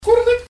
kurlik

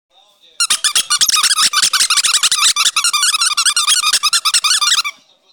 pisk igrushki

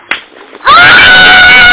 krik dushi

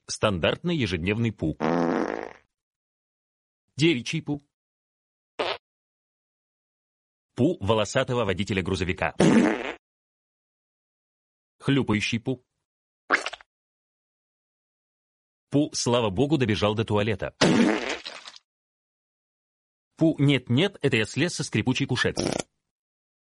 zvuki puk

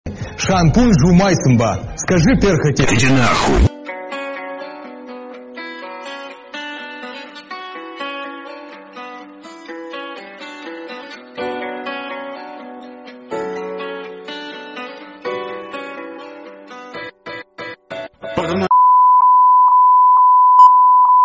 randomnye zvuki

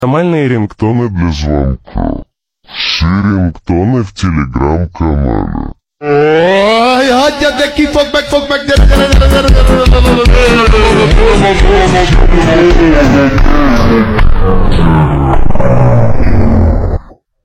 normalnye ringtony dlia zvonka

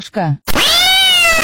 koshka

